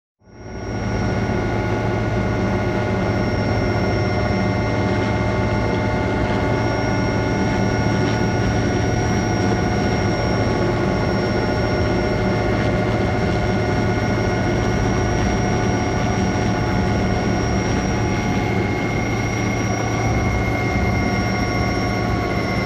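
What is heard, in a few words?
sound inside engine airplane which shuts down; Nano Audio FX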